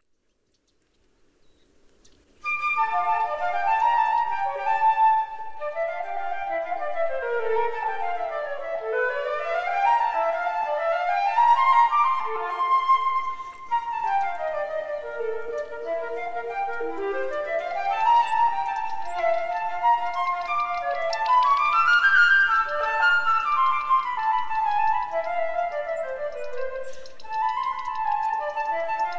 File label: Suite in D Minor, Courante; Suite No. 2; BWV 1008